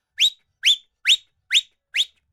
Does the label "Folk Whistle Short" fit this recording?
Yes